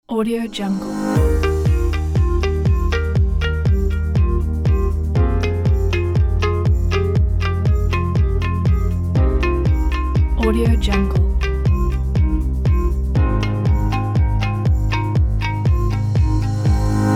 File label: inspiring soft uplifting background